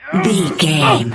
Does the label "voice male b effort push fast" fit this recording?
Yes